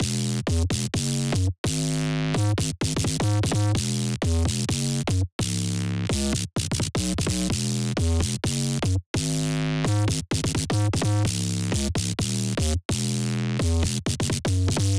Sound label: 128 BPM G# Min Bass; Drop Loops; Loop Stems; Cymatics; Take Me Away